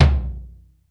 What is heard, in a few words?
BEAT KITS, T; Crypton - DrumBase5000